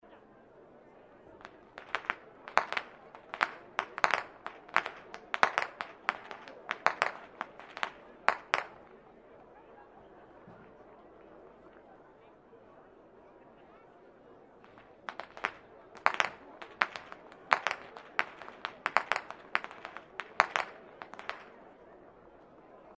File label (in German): schnalzen, jugend